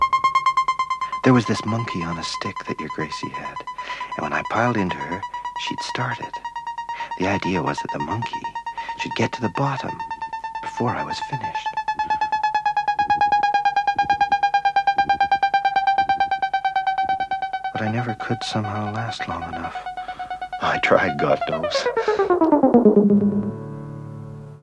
Descending monkey; Duffer

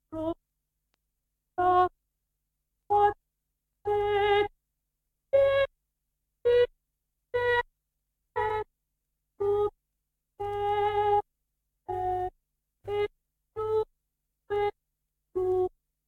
Cut Tones; Amor Dei

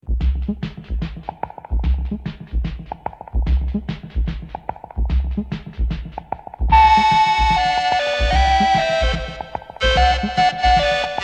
Chromophone Band

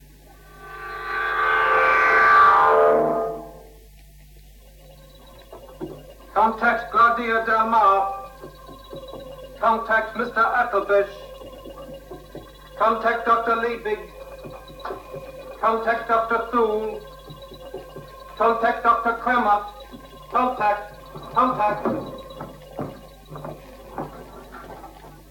Scene change + busy, high pitched note; The Naked Sun

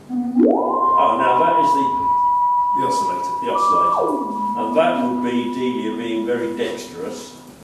Melody; Whooverville 5

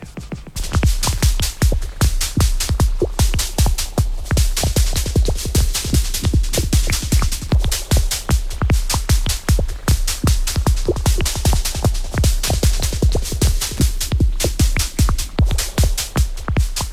Rhythm; Dance from Noah

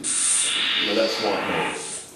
Hissing; Whooverville 5

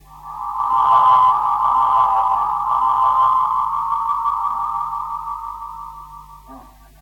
Dramatic crescendo; The Naked Sun

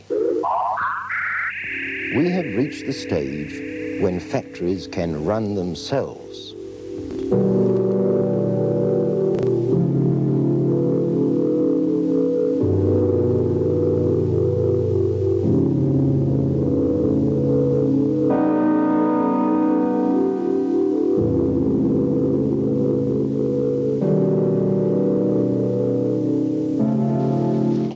Factory Music

